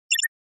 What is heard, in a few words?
Cyber